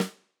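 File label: Tam Snare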